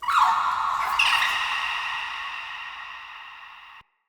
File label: Day Pixies, Ambience